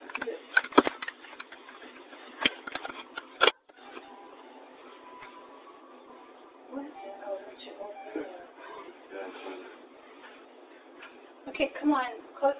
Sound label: piano playing